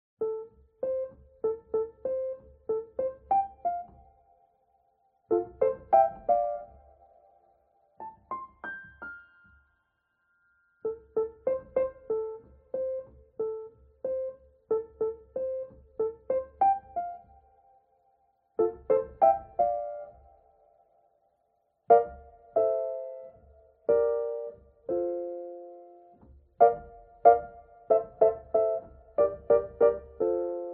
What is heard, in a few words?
Piano cover; Animal Crossing Wild World